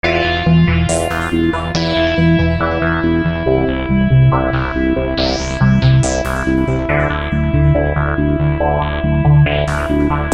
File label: Transition; Portal tier 3